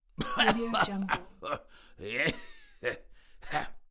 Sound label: old man coughing